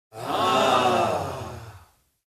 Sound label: Crowd Relief reaction